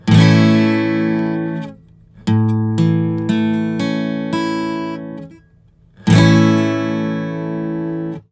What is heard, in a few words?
Major or Minor